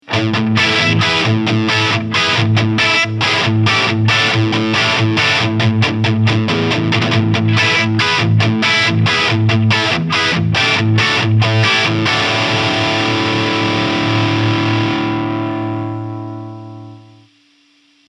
Heavy Crunch; Framus Idolmaker 5R

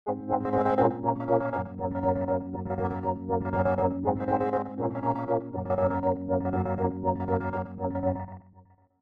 Signal Tape Loop Expansion; Halfway There Funky Dots

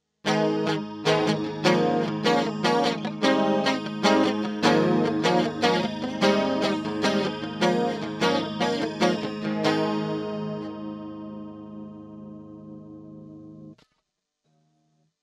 guitar chorus

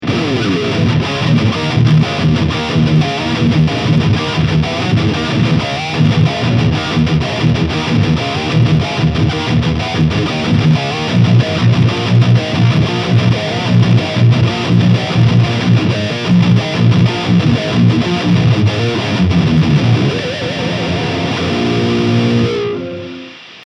Ibanez XPTB720 High Gain

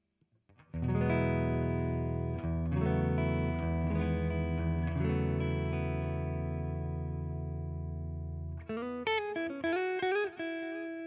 Line 6 Catalyst Clean SM57

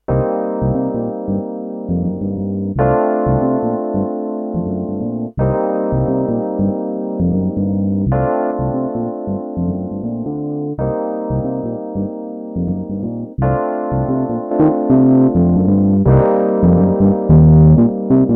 EP Effects Galore

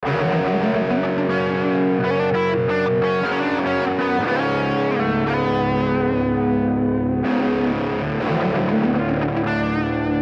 Kemper Profiler Stage Brit

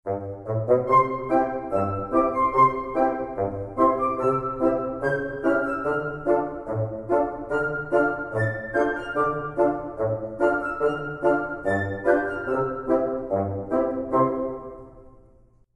tutti

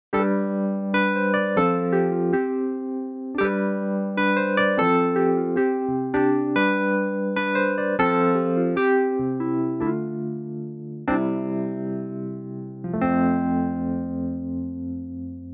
Kontakt7 Wurly

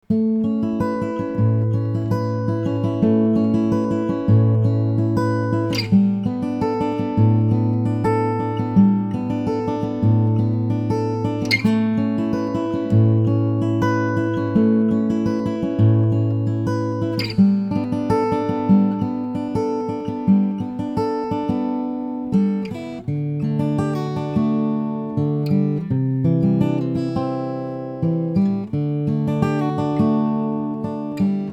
Picking

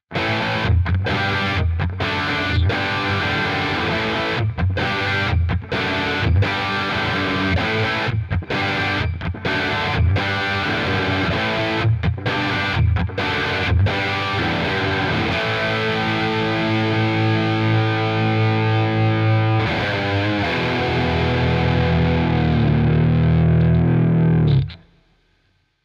Defined Crunch

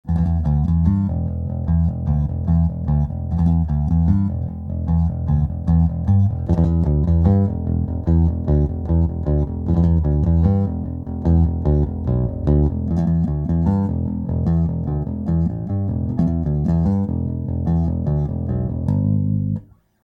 Rick; TTE Colour Max Mikro

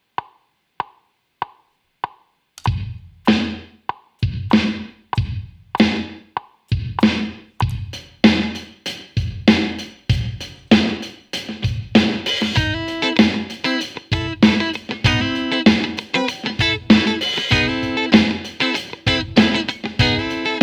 AMP Session

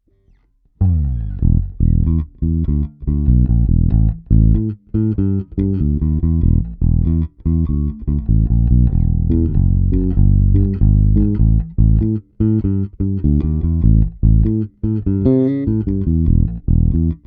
Blooze

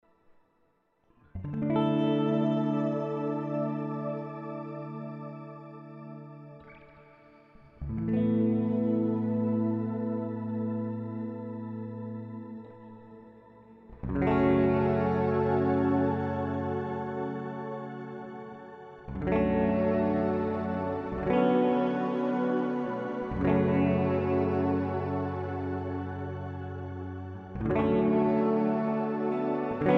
Reverb Shimmer; Specular Tempus

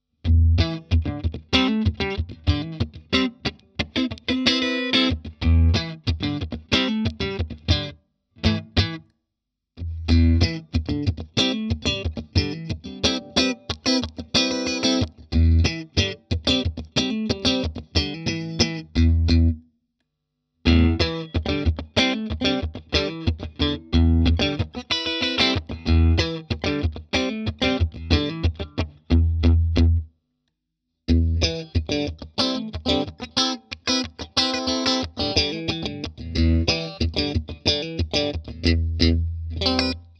Clean Strat

